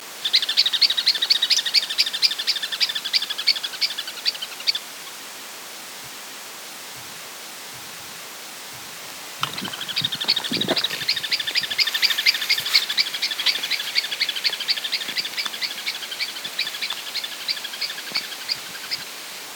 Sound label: Campo Miner